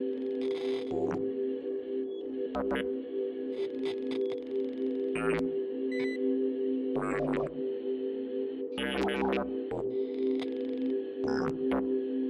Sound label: roboport working; factorio 2.0.55